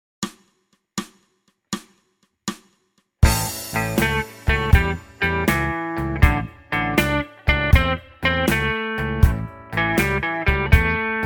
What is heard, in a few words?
blues